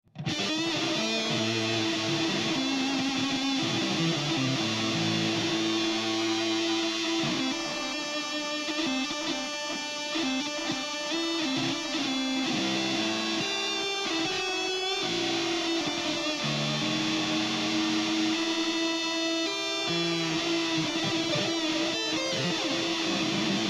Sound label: Lizard Fuzz; ScreamingLizard